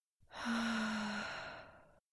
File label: sigh